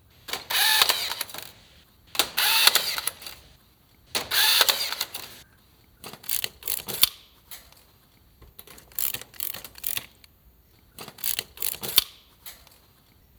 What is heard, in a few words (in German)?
Stahl verbinden